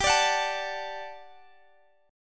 G Major 7th Suspended 2nd G, Guitar Half Step Down